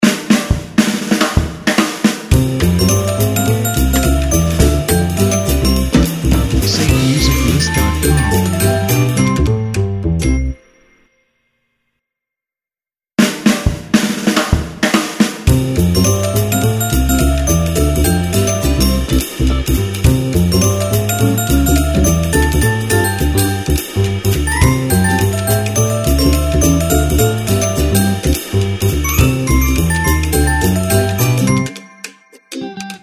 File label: Joyful Jazzy Tune Intros; SafeMusicList